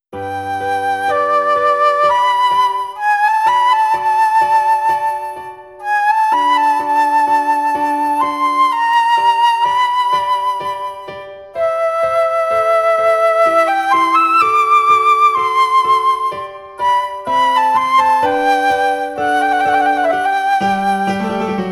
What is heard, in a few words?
flute; Mozart